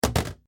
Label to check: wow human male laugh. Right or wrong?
Wrong